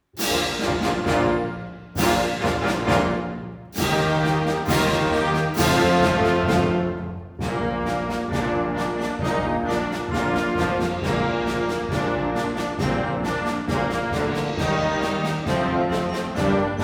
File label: Symphonic Band; WTAMU Symphonic Band